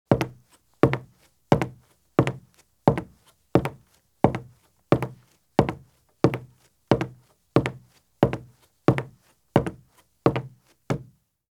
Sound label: Men's Business Shoes Walk at Fast Pace on Wood Porch or Footbridge; Sound Ideas; Footstep Sound Effects